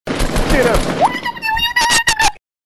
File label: noises in the house